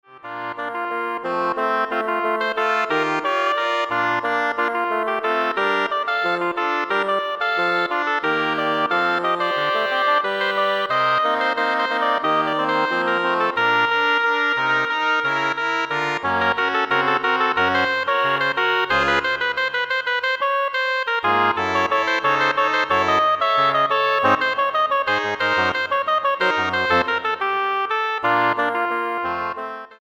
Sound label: Double Reed Ensemble